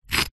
stone small single scrape on rock or brick; foley

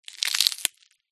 horror gore body part break snap

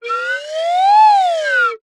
cartoon slide whistle double pitch up down

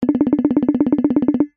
cartoon running fast footsteps percussive; zapsplat

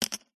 foley token plastic set down wood

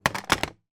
picture frame small fall over

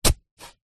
metal shovel spade single dig grass soil; industrial; zapsplat

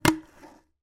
household glass jar lid remove

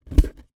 card shoe box lid open inside; zapsplat

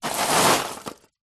foley charcoal pieces movement in metal fire pit; zapsplat

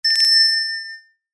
bell small ring close clean shop door bell

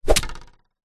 warfare spear land soil vibrate; zapsplat

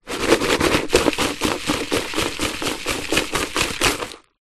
foley dirt in plastic pot shake

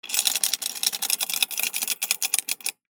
Chains pull Chain Hoist; Just Chains; soundbits

